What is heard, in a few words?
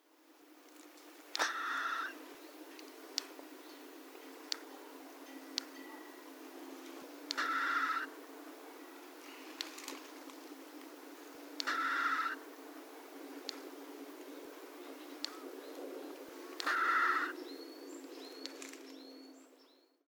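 Japanese Tit, fukuoka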